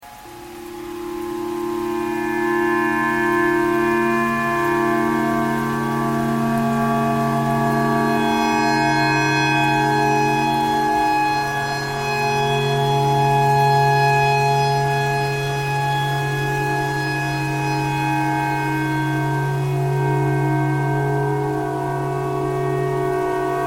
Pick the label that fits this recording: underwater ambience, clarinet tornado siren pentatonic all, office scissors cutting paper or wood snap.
clarinet tornado siren pentatonic all